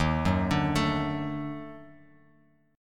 D sharp Minor Major 7th Flat 5th D sharp Bass Standard; arpeggiated